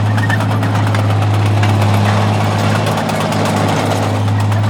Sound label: tank on very low